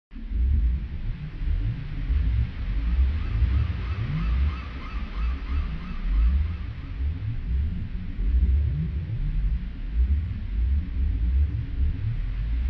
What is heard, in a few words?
Beach Ambience; Donkey Kong